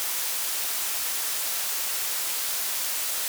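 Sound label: gfsk 16000 bps encryptor nato; 39825khz cf; sifrator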